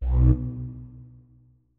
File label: flute notes; Hideout Furniture